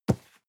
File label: cardboard box medium grab slide; objekte